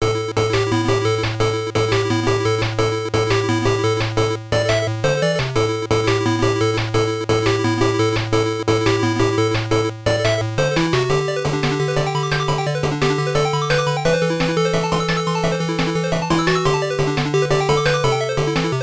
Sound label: BGM; Futari wa Pretty Cure; Pico